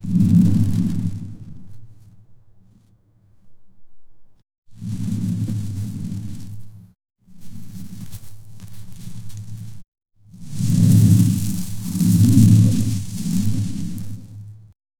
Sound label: rubber ball rolling in gr, grass